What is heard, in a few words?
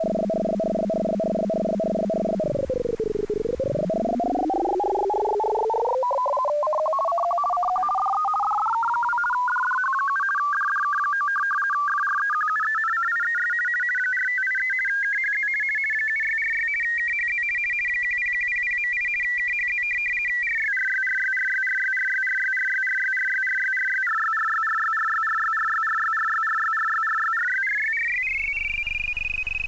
rtty dwd moving hard; fsk demodulator